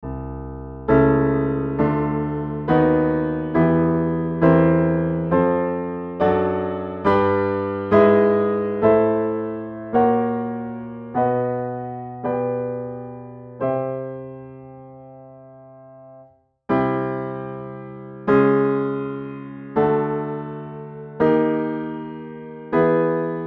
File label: Zarlino Chromatic